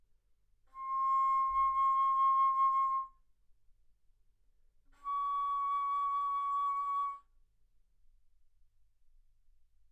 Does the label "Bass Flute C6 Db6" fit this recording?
Yes